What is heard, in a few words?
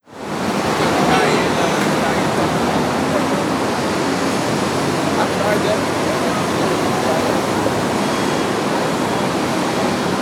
Cities lon Traffic